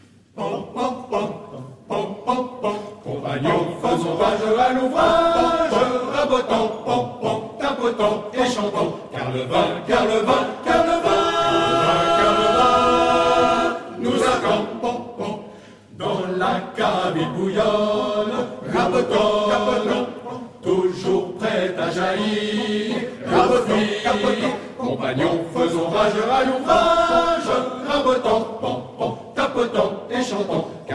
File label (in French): Chant des tonneliers; Kiosque a musique Gland; Chœur du Léman; Coppet